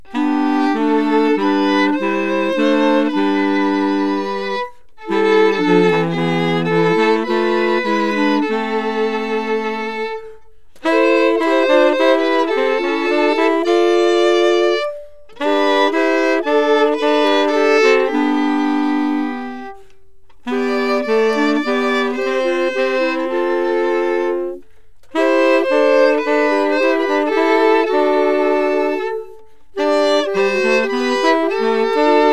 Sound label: Bach Chorale